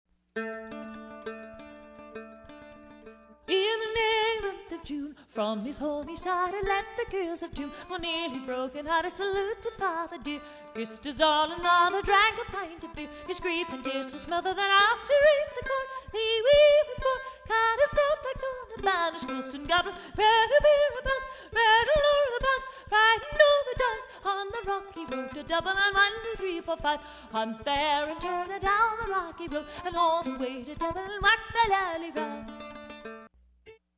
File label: solo